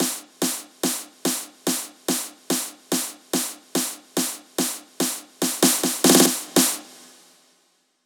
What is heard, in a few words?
144 BPM Roll Snare; Vengeance - Essential Clubsounds Vol.5; Flight Kit Root Amin; Drums & Perc